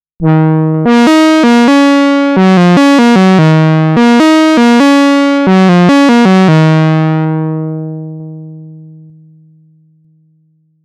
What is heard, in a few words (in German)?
Saw Pulse mittlere Cutoff Reso